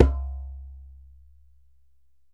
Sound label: DJEMBE